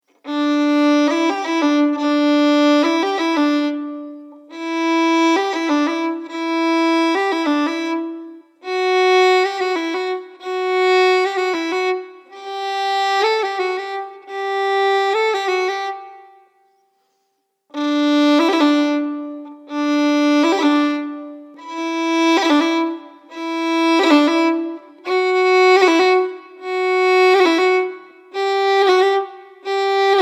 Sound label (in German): Ubung Roll